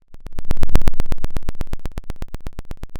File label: Sound of a thunderstorm, center